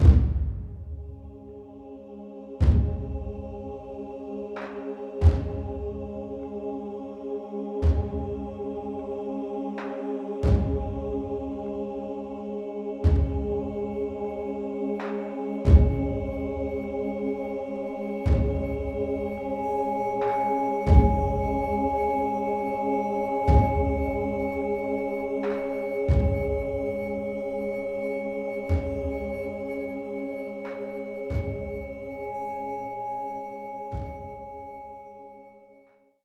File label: Hubs Amb Cue B; Syndicate New Loka; Tenno Hubs Loka